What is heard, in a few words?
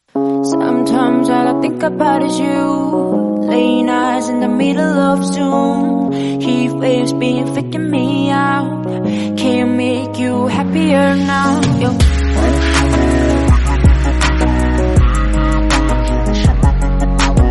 tiktok version english song